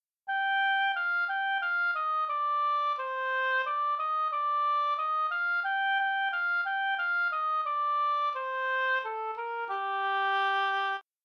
Natural, scales Minor

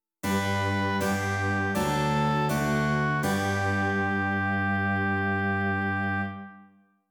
continuo, dictation

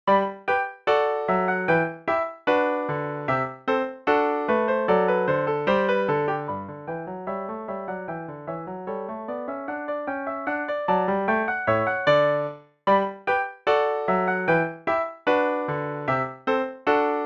Gavotte; Bach

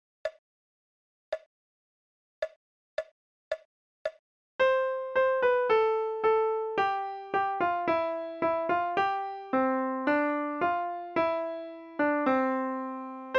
English Country Garden right

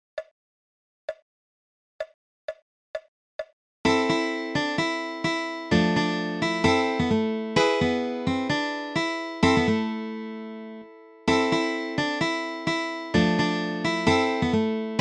Harmonica

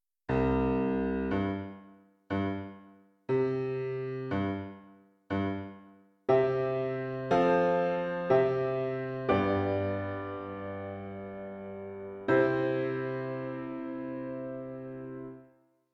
Piano

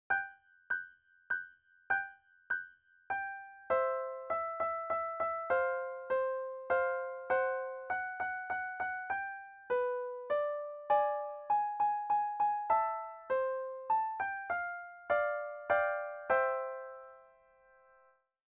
We Wish You Duet

